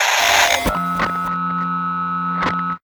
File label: Electric Knockout; Plastic Surgery